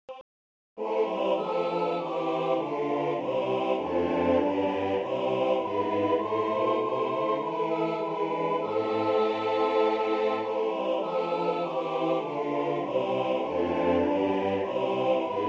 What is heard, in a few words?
O Sacred Head bass